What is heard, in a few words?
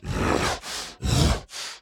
Enraged Breathing Loop; Devour; The Town; devour 2021 gamerip pc macos